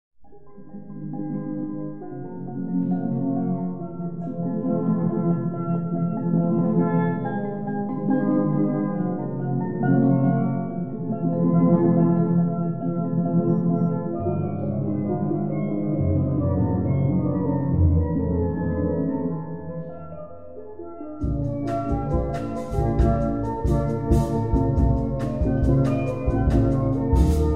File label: humming bird